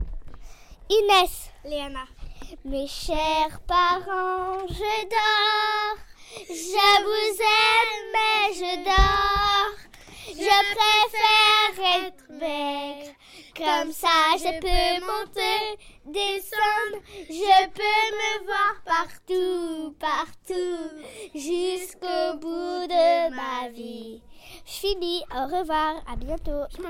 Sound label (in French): chant duo